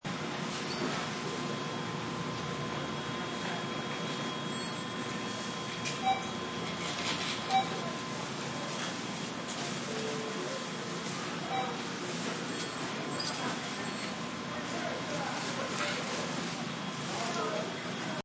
Asian Market

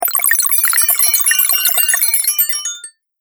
Fantasy Magic Water; Magic Sound Effects; Andrew Fleming

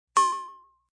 Clink, Metallic; Metallic Sound Effects

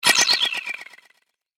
Subtle Knife Spell, magic sound effect; fantasy sound effect; Andrew Fleming; Twistedtracks

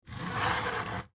Window Frame Open, Wood, Slide, Movement; Household; BlastWave

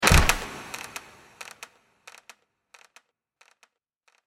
Trap Door, Trap door opens and swings.; Miscellaneous Doors

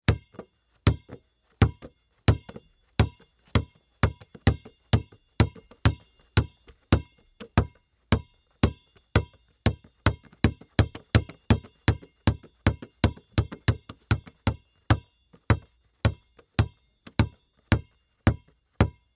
Sports Basketball Dribble Bounce Long; SFX Bible 6

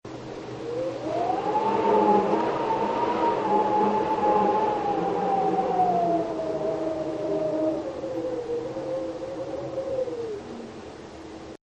Wind Whistle; Weather; Partners In Rhyme

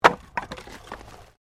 Wood Drop; Material components for constructing more complex sounds; Sound Effects:Materials

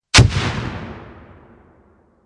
M67 HE Grenade; Grenades; Explosions; VRSonic

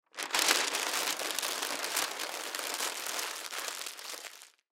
Easel Paper Crumple; Office; Materials; Blastwave

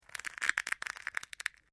DRY BRITTLE SNAPPING

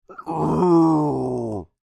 Creature Speak Moan Upset, Human; Groaning; Voice; BigRoomSound

